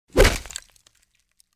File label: crack, Medieval Weapons Sound Effects